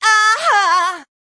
a-hahuh, FEMALE SINGING; VOCALS and SPOKEN WORD

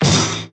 vintage cartoon bump; Cartoon Sound Effects; UNUSUAL KITS and FX

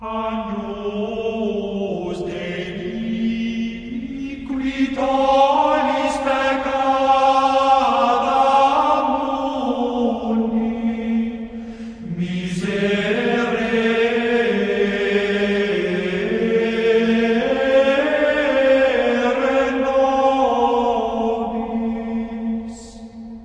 gregorian, male singing; VOCALS and SPOKEN WORD

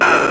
phlegm hit; Weird and Interesting Hits

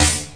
stretch snare drum; Snares

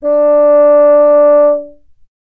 tenor trombone mute d4; Horns and Brass

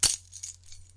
earsplitting crush, drums single hits; Claps

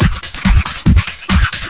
140 bpm low beatbox

